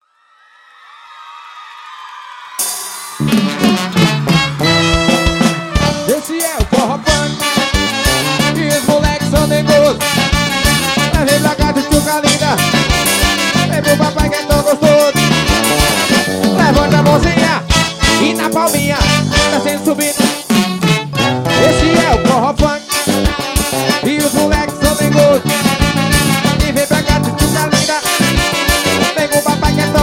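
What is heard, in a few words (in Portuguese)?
forró funk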